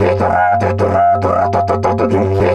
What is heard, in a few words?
G 100, DIDGE 100BPM, Didgeridoo